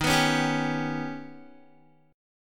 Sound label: Eb Augmented 7th Eb down Guitar; Eb+7 strummed; JGuitar